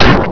machine

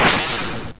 slide

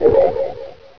ghost

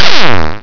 beam

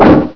swing

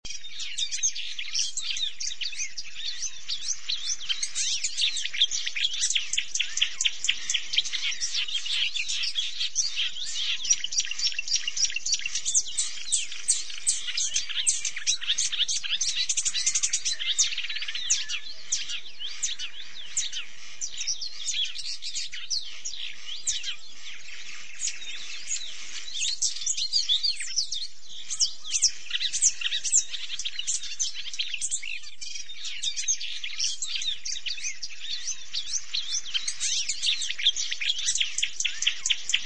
bird, healing

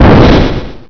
dogon

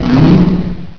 barrier

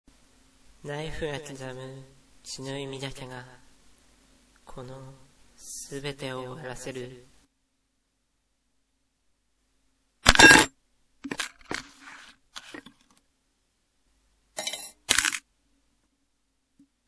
knife

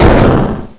dogon

